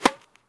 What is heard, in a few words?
ping start; firefight